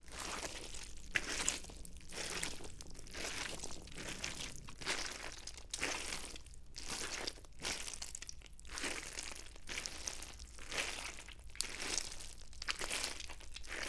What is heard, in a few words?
wet spaghetti